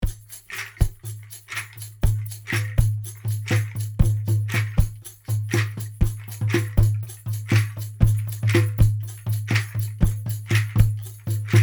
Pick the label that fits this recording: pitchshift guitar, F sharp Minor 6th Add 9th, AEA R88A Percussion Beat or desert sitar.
AEA R88A Percussion Beat